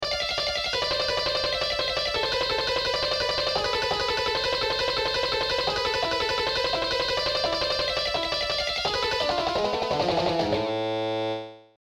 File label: Shredding Exercise